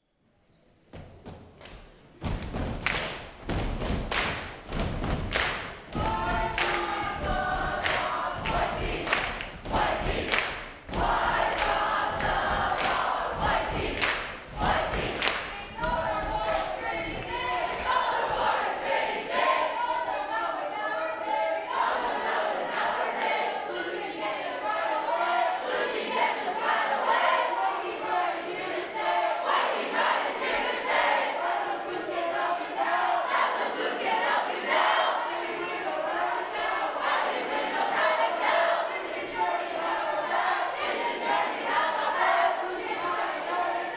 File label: Cheer